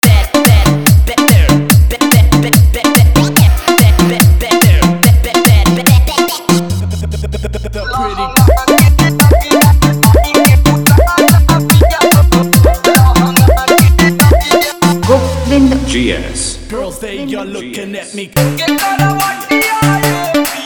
Bhojpuri Song

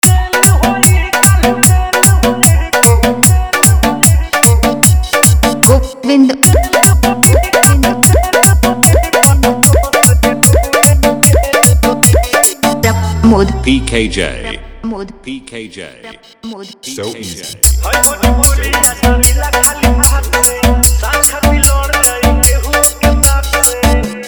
Bhojpuri Dj Song

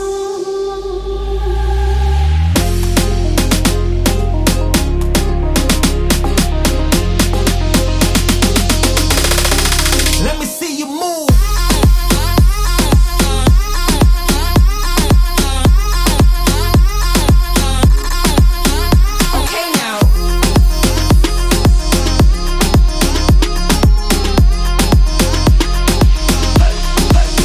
Club Mp3 Song Remix

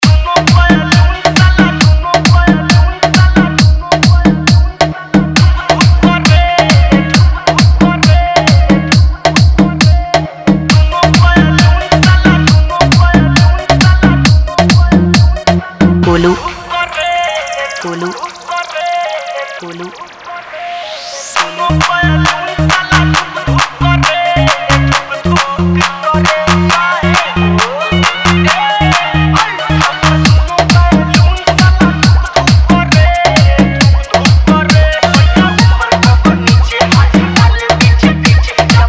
Remix Song

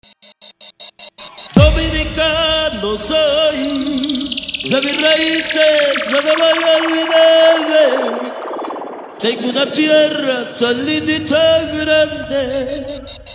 Trance Mix; Hollywood

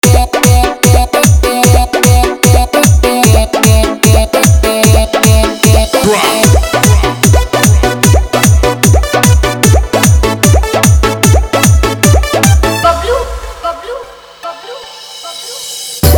Bhojpuri Song

